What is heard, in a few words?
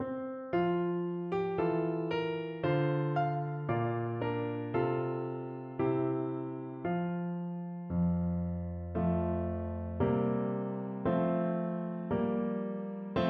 roving Euphonium